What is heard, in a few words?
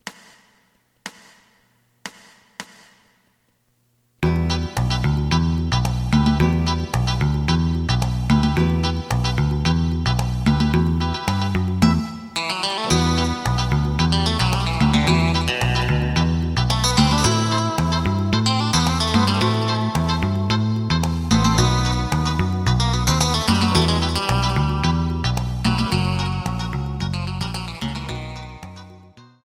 drum